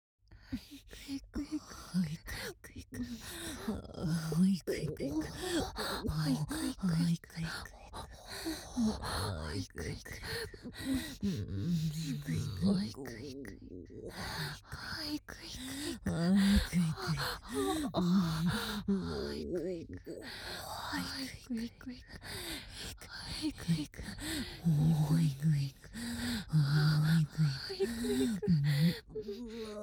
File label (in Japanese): 低音喘ぎイクイク連呼; 人妻×OL